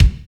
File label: KIK, R&B Kits, Roland; Northstar - Drumscapes Roland; Wet Kit